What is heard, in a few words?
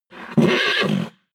horse neigh agitated short; natur-tiere; pferde